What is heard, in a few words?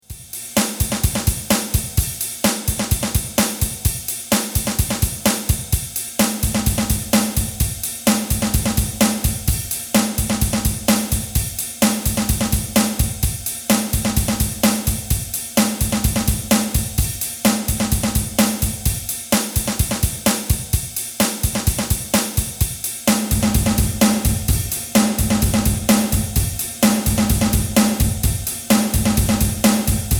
Lexicon PCM70 Tiled Room Drums